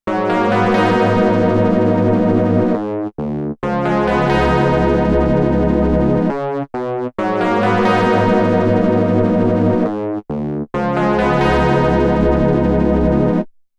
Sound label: KORG Odds Static Phase Distortion